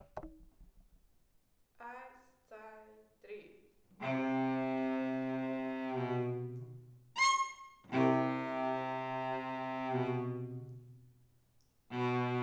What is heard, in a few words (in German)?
cello; Kinderorchester